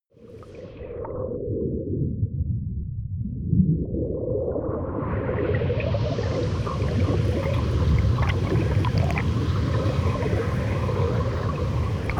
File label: water fx